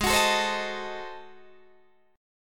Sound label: G sharp 7th Flat 9th G sharp down Guitar Open D, chord